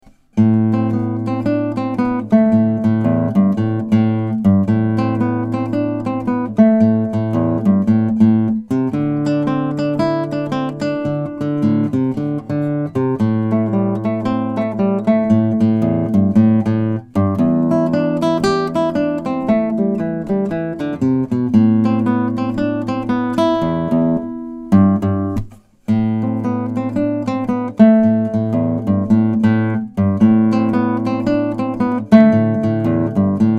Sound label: Guitar in Action